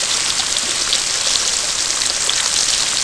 hydrant water loop; natas; Tony Hawk's Project 8; XBOX

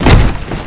hay bail; WhiteOut; XBOX; trojan

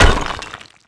star break; Tony Hawk's Project 8; XBOX

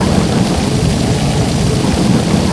waterfall

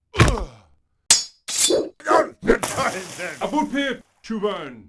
duel outro amb lose; Sid Meiers Pirates; XBOX

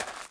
footsteps gravel

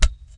Ladder; Player; Footsteps

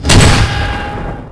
Launch; Quantum Redshift; darius

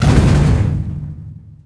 metal hit; objects; Star Wars Obi-Wan; XBOX

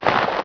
leather jacket running right; characters

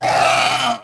die, Dino

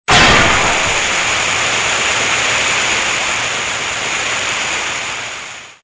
pipe; Soldier Of Fortune II Double Helix; XBOX

